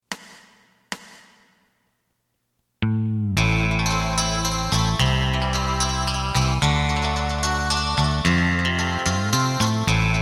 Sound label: drum; エレキバンド用カラオケCD